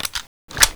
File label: Inf Weapons Foley 3rd; Red Orchestra 41-45